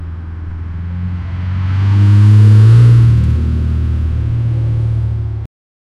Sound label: a jeep is driving by